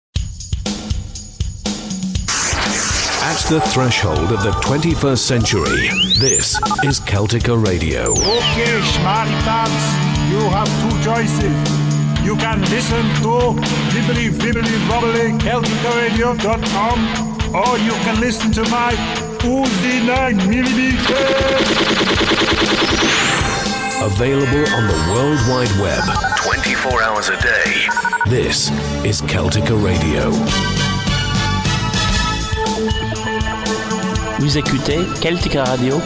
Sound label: Jingle Track